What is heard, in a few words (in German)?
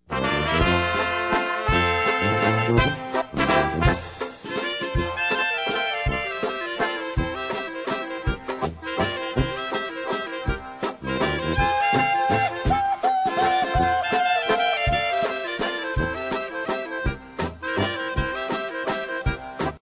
SCHUHPLATTLER TANZE